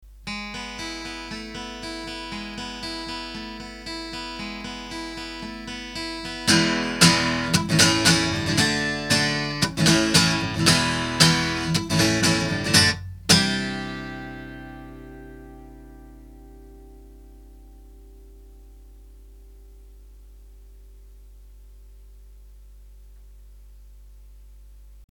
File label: Yoga DM-564 guitar, microphones recordings